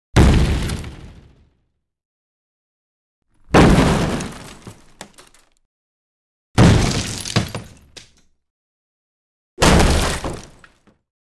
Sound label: Different explosions with shrapnel